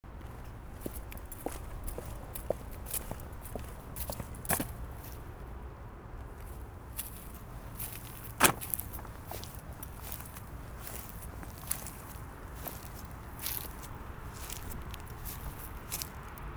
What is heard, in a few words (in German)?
Schritte auf steingras